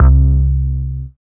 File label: BASS MONO